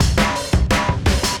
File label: Beat Style E 170, breaks; 170bpm